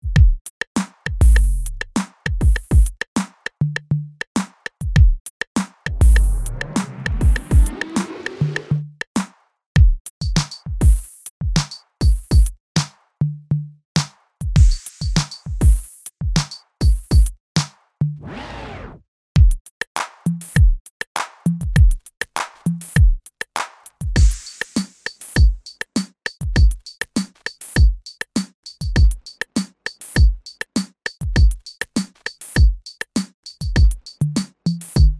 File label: vinyl drum machines